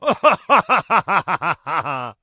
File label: wow human male laugh